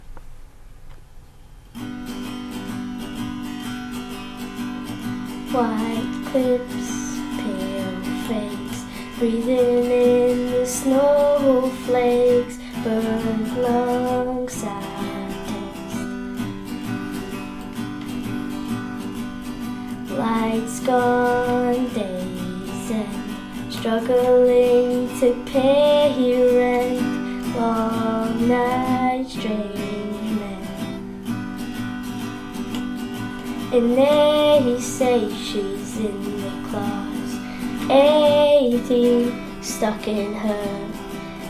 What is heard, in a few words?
Acoustic